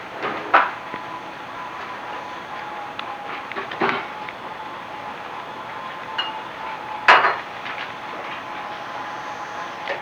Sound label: Microcassette Noise; FX; Cymatics; Eternity Sample Pack